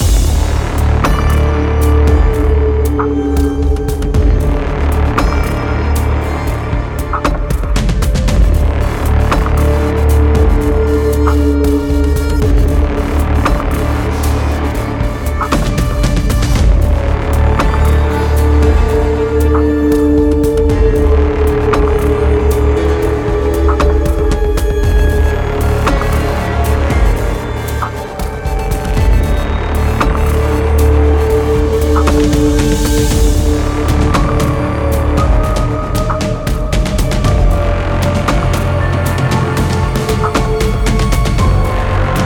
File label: Battle Theme; Canto V Battle Theme C1